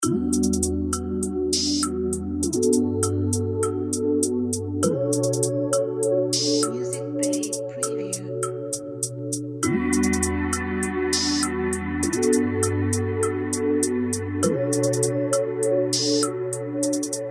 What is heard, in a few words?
Ambient Chill Smooth